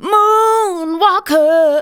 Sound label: vocals sung; Techno Trance Essentials